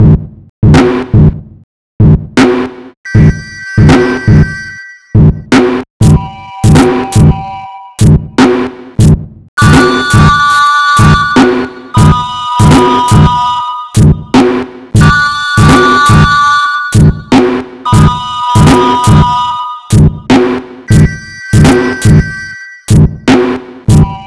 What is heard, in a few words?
sinewave